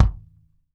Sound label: DRY, KICK DRUM; Sampleheads; New York City Drumworks VOL-1